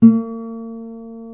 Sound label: B 3 MARTIN 6, Guitars